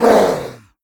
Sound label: ogre death; Crypt of the NecroDancer